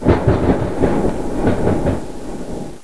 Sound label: point, train, class 66; OpenBVE